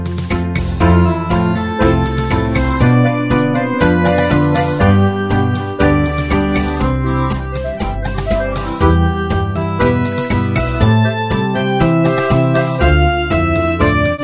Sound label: Tango